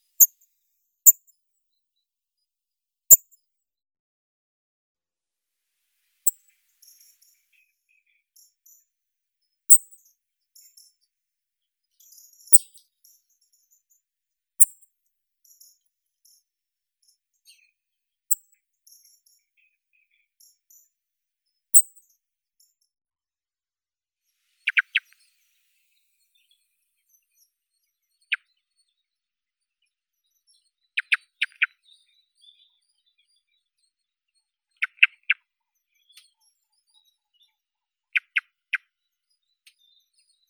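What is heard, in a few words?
song thrush, turdus philomelos; drozd pevchii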